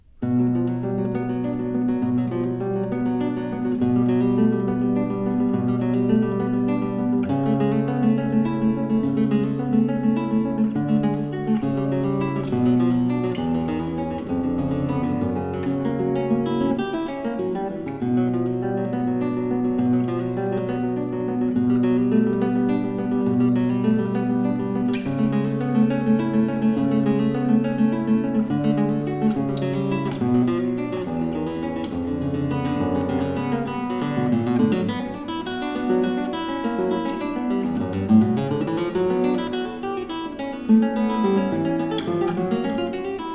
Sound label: allegro solemne, guitar